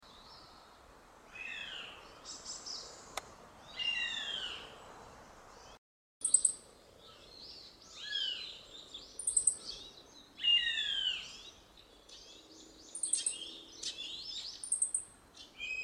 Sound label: ootakahina